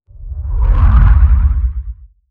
whoosh; transitions and movement

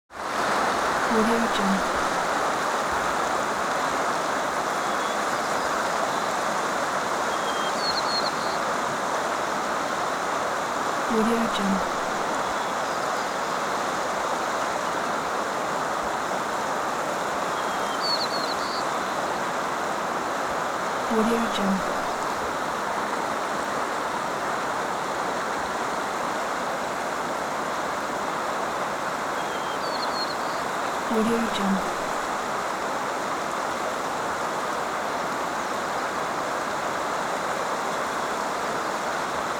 small stream flowing in distance; جریان آب

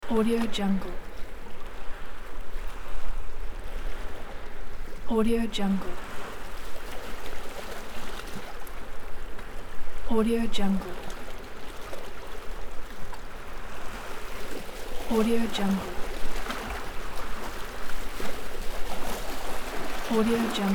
seaside calm sea waves

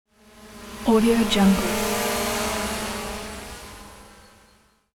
small drone fly by; transitions and movement; پرواز پهپاد کوچک

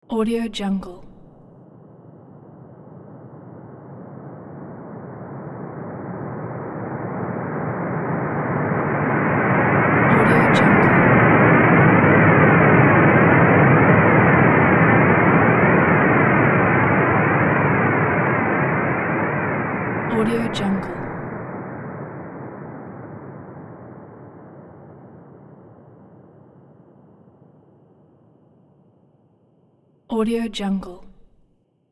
galaxy whoosh; transitions and movement

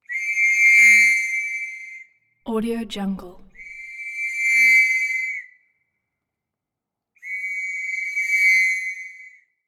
foley whistles whistle exterior whistle tube; سوت کشیدن با لوله; game sounds

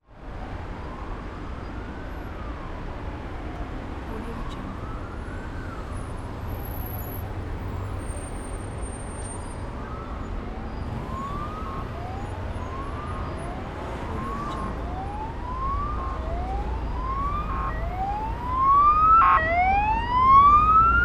city afternoon traffic emergency car pass from a; urban sounds

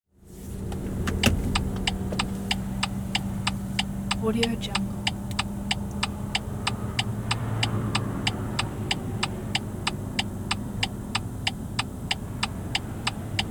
car signal blinkers idle at light; urban sounds

